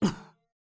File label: Voc Male Land